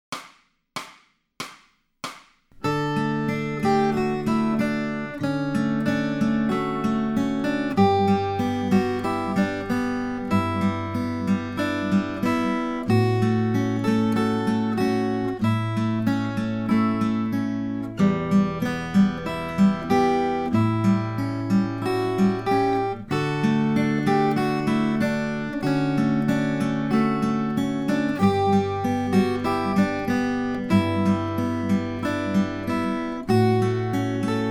Accompaniment, acoustic guitar; Grade 4

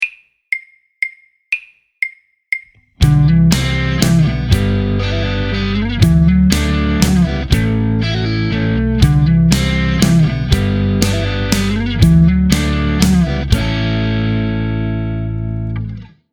Waltzing Walz Full Mix

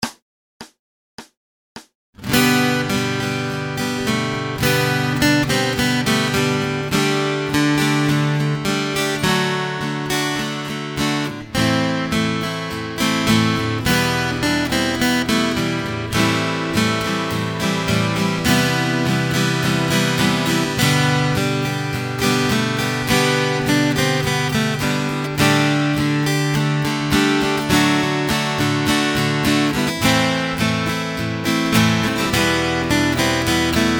Acoustic Accompaniment, acoustic guitar

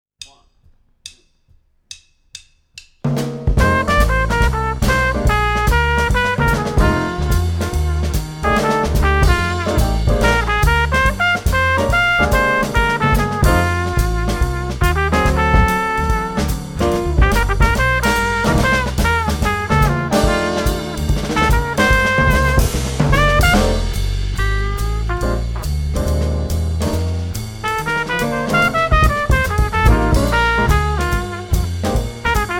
Up Tempo Blues, jazz wind

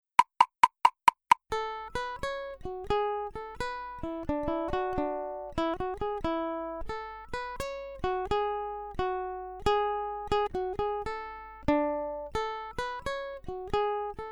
Accompaniment, ukulele; grade7

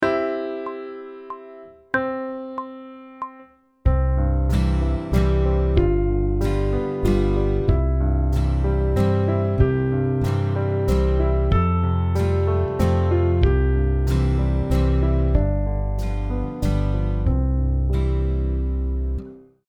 Sight Singing backing track, pop vocals; Grade 3

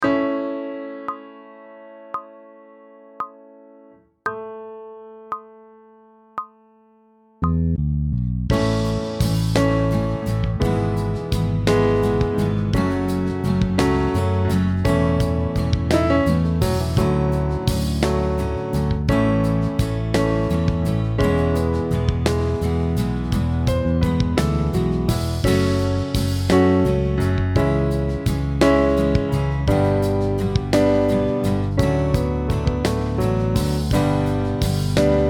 Sight Singing backing track, pop vocals; Grade 8

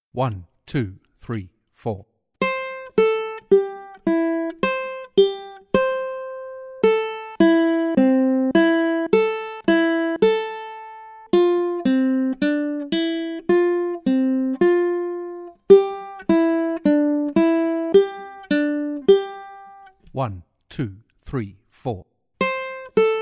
Accompaniment, ukulele

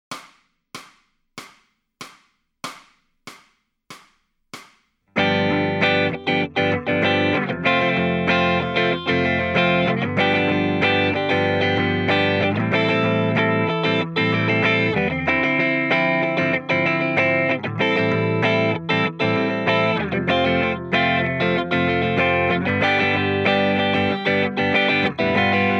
Lead Backing Track, electric guitar

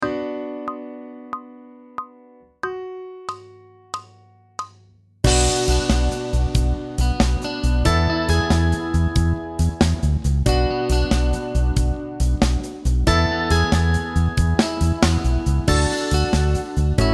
Sight Singing backing track, pop vocals; Grade 7

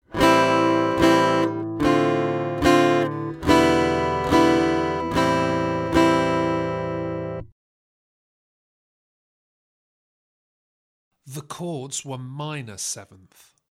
Aural Harmony Test, acoustic guitar; Grade 2

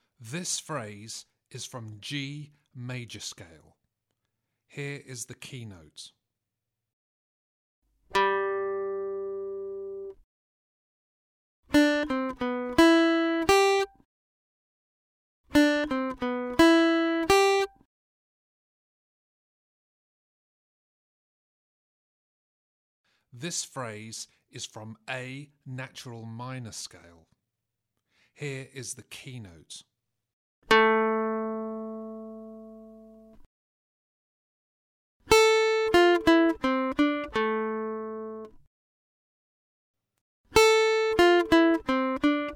Aural Pitch Test, acoustic guitar; Grade 5

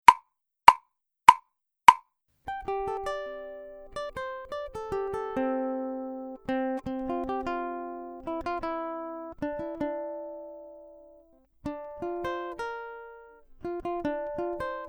ukulele improvisation; grade7

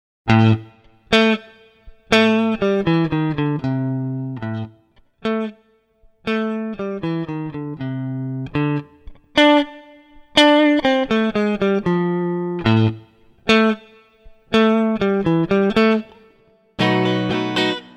Chart Reading Example, electric guitar; electric guitar performance diploma

